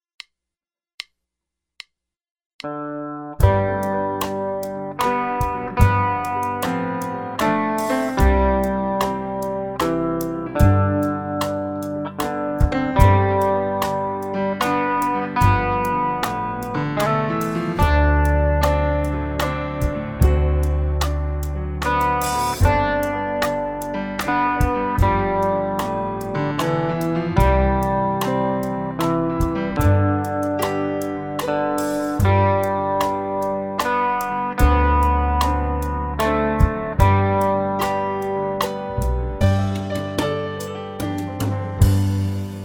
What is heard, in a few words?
amazing grace with melody; xmas